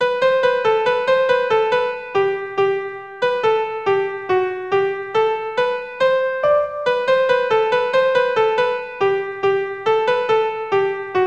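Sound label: Polka, Traditional Irish Music; Ballinafad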